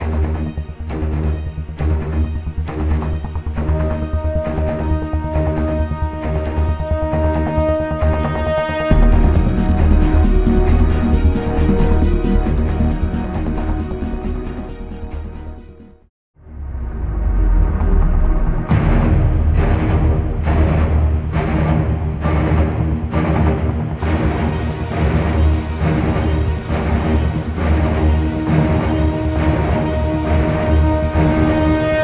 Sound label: Synth vs Live orchestra examples; Tom Player